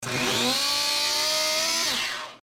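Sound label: Mechanism Fast Hi Rev, servos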